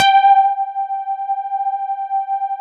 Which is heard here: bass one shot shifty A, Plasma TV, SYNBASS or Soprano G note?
SYNBASS